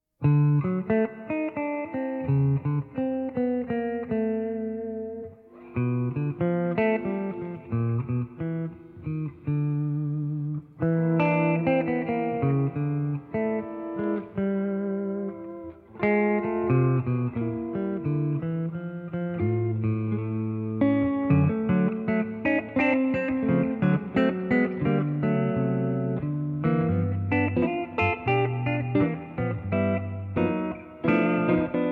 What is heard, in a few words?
two guitars